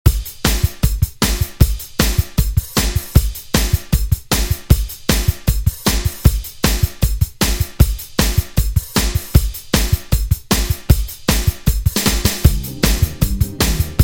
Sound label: 80s Dj Intro Outro 155Bpm